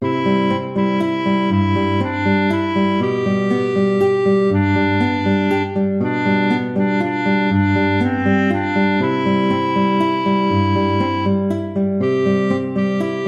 sweetly the bells are ringing

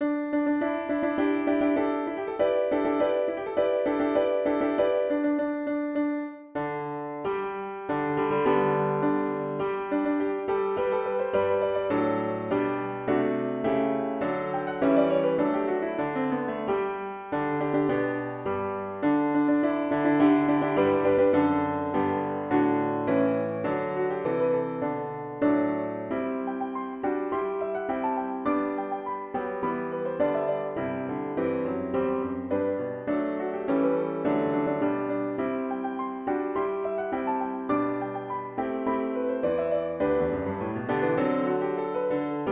hymne national australie; mccormick peter dodds; advance australia fair

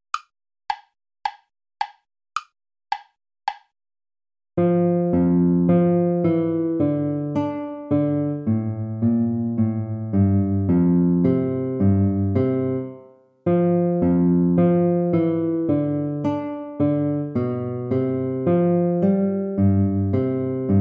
gavotte play along; haendel georg friedrich

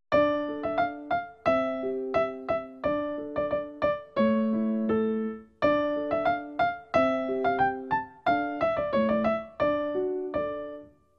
bransle torch dance; praetorius michael; bransle torche; fackeltanz

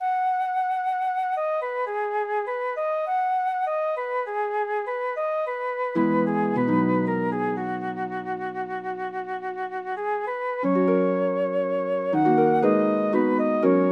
flute harp

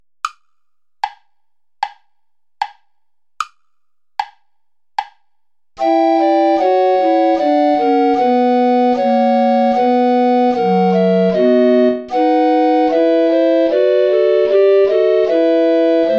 play along without soprano recorder

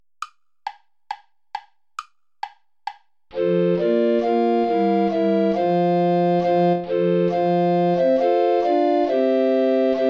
two dances play along without soprano recorder; morley thomas

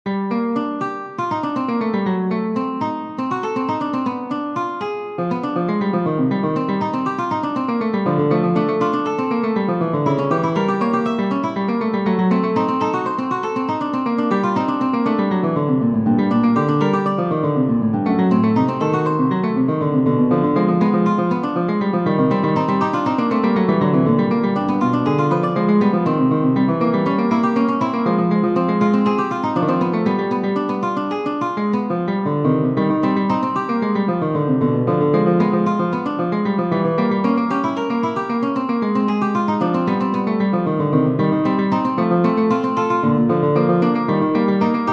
capriccio minor for guitar duet; haendel georg friedrich

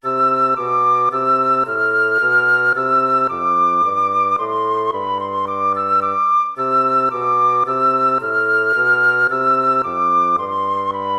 tempo minuetto; hook james

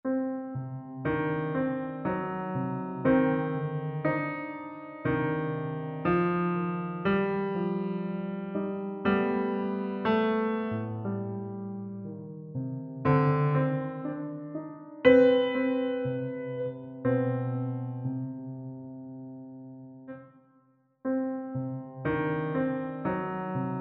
koto; jean francois xavier; tori itta

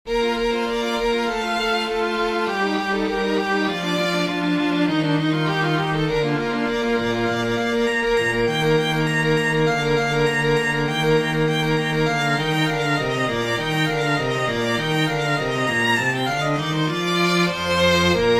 for oboe strings; vinci leonardo; voi che mie vicende; semiramide riconosciuta

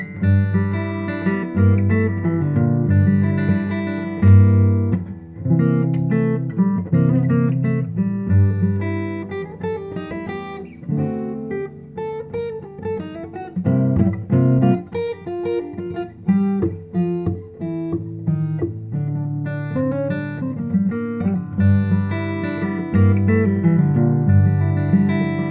riff blues

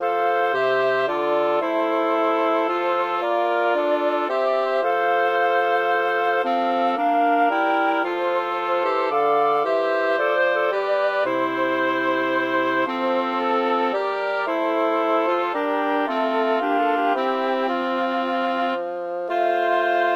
for woodwind quartet; cantino i popoli

